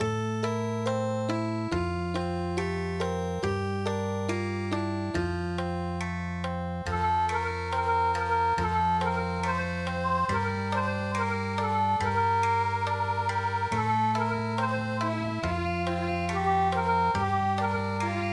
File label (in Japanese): komoriuta